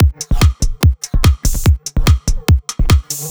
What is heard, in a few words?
145 Full, Drum Loops; Zero-G; Soundclash Nu Dance Electro; Acidized Wavs; 145bpm; Buzzing Fly